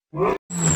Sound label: dragon arrow